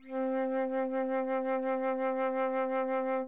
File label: flute C4